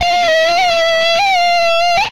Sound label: Skid, retro arcade vehicles